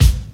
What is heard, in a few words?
original kickdrum sample g sharp key, kick drum sound tuned to the G# note